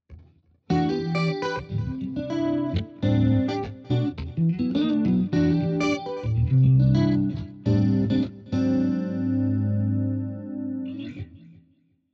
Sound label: Neo Soul